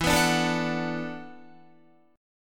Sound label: E 9th Suspended 4th E down Guitar; strummed; chord; JGuitar